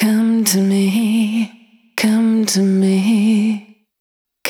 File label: Come to Me, Vocal Samples, Single Voice Melodic Loops; Singles